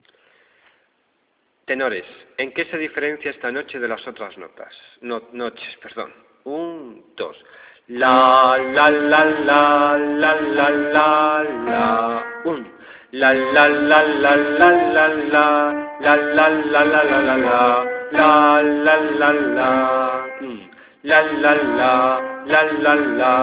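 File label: tenor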